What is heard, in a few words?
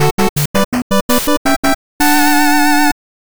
win; snake